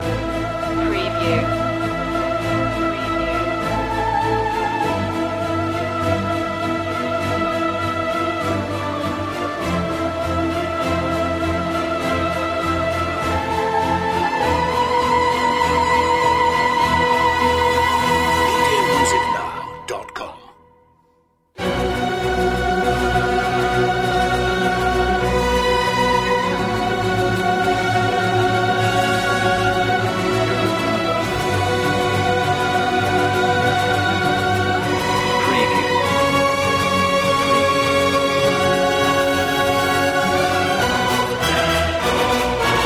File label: no perc